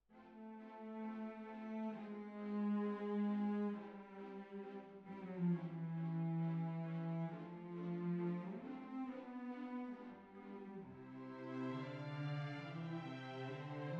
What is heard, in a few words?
Cbasses